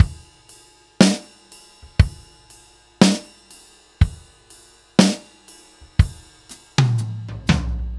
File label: Ballad Loop, Drum, 70s Ballad; Drum Tools